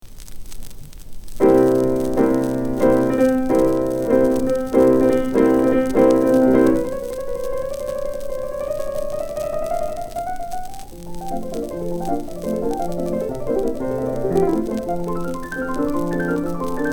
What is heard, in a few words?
chopin waltzes; kilenyi